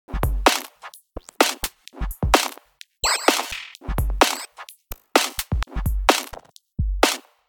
House loops 128BPM; Vengeance Samples

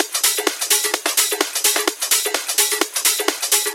House Loop, 128 BPM; Vengeance Samples

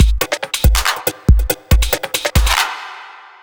drum loop, Dubstep; Dubstep Sample Pack

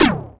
Percussive FX; Vengeance Samples; VEH1 Percussion

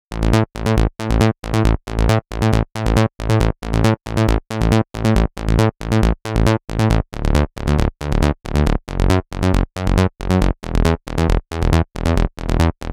Heavenly Kit 137BPM Bassline DRY, A major

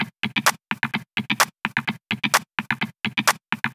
Minimal Loops

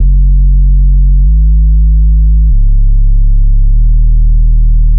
Bass 96 Bpm

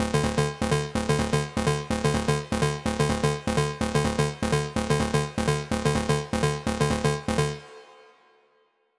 126BPM Seq Root D, TechHouse; Connectors; Vengeance Samples; Bonus TechHouse Kits

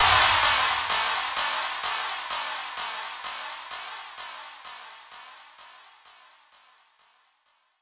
FX Downlifter

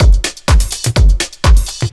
drum loop 125, vocal house; PS-28 Vocal House Samples; drumloops; subcompilation

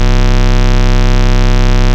Ultimate Bass Root C; Vengeance Samples

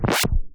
Vinyl FX and Scratches, FX Scratch

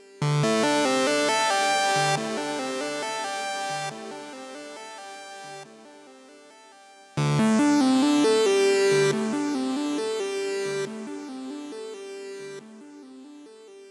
SynthBreaker WET 138BPM; Melody & Synth; Dminor; Vengeance Samples; VTS1 Nightfly Kit

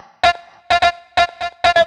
House Loops, Vocal Loops House 128BPM

